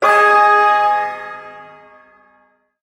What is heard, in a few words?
Shawzin Chromatic Chord; Chromatic Chord F; Warframe; Duviri Ersatz Shawzin; Chords